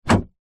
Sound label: transport car door lock or unlock internal toyota corolla